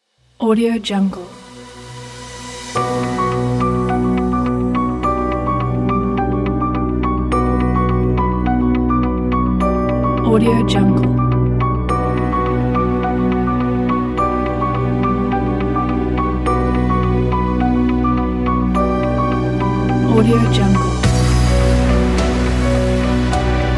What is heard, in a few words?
cinematic epic inspiration; jambo-tt